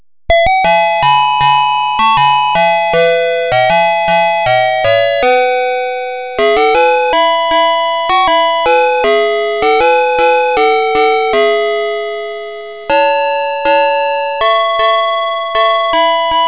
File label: Kiddie Ride Boards